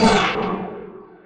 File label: searing arrow layer; weapons; dota; clinkz